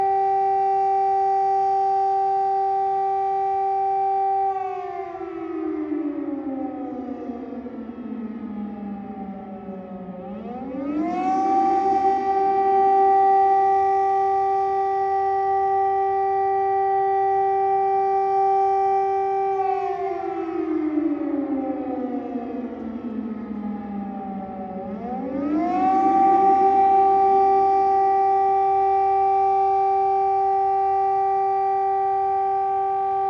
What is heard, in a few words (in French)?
Sirene Nuit; la nuit. les citoyens de Kyiv et autres villes en Ukraine